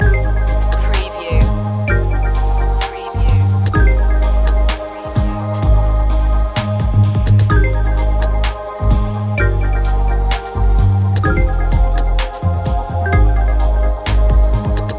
gentle touch loop; refined

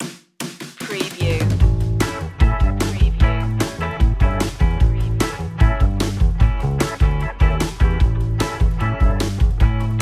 funny fight underscore, brisk

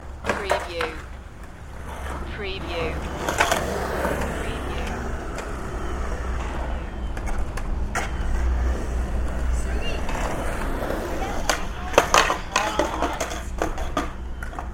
Skate Park

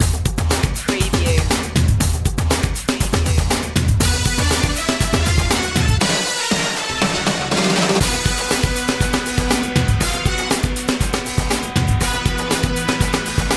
thunder cane, horn section

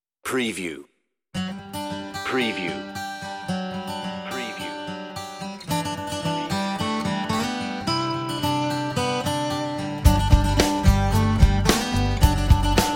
Acoustic Rock, on-hold music

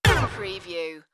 Synth Shot Mid, short

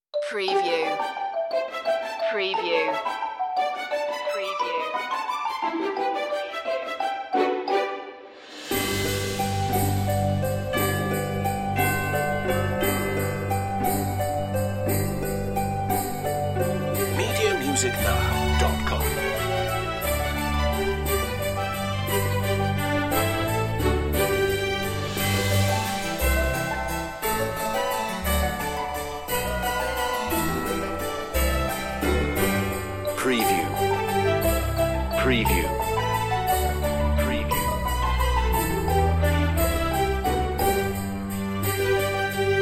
We Wish You a Merry Christmas, on hold music

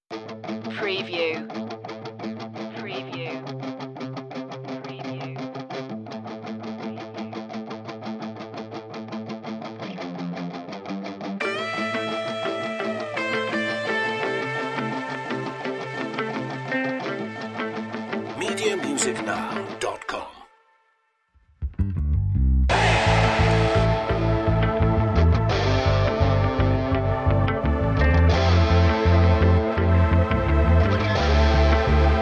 shifting gears no drums, Music stinger

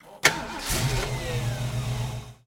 Mustang 68 Fastback Start Up Engine Perspective; lynne-publishing

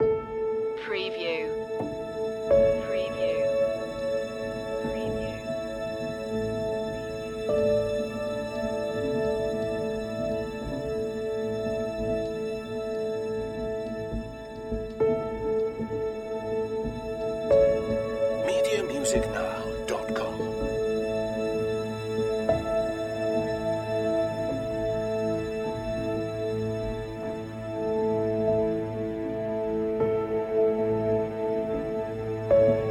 aether loop, ambient atmospheric

